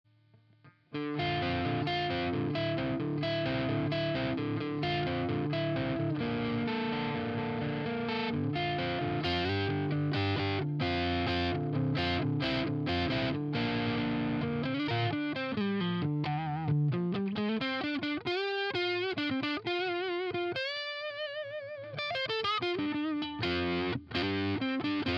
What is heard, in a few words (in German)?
Harley Benton CST 24HB dist SPLIT hals